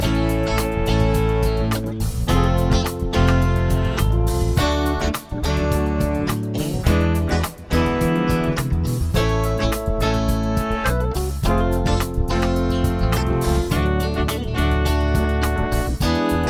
chord prog with mixture

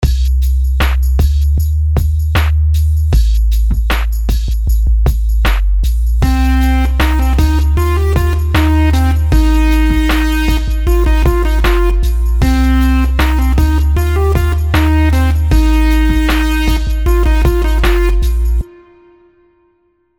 melodies simple 16s Cmin; melody

